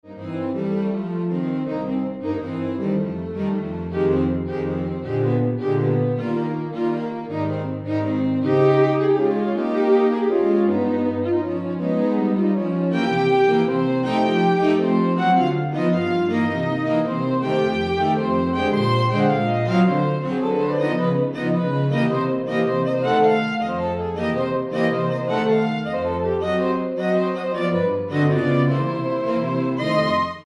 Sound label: Happy Music